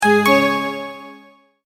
multimedia tone verified; Sound Design; McKinney Sound

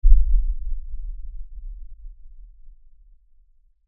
Impact Lfe Rumble; Low Impacts (Sound Effects:Impacts); Blastwave

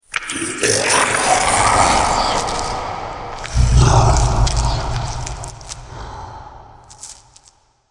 Filthy Slobbering Fiends, monster sound effect; Andrew Fleming

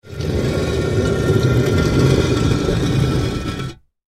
Rock Slate Movement, Rock Slate Movement Slide; Materials; Foley; Blastwave

